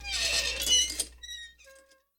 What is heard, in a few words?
Gate Opening